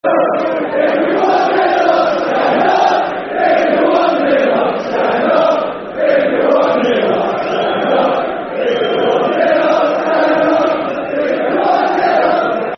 Stand Up If You're 1 Nil Up [Football Chants (Soccer Songs)]; fanchants; Ipswich Town Itfc